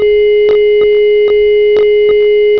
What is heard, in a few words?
TUUUT